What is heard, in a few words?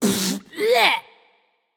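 clear throat, male; smite; voicepack; tiamat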